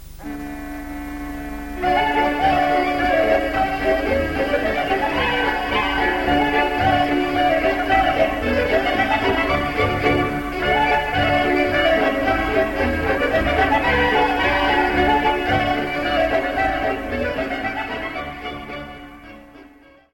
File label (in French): Polka - Republique tcheque; Stebetalka Polka